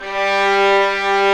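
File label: strings, 8pc, vibrato; String Master Series; Vlns 2-5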